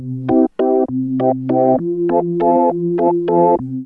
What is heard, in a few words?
Fanfare; Worms Armageddon